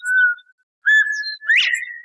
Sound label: quail; upland birds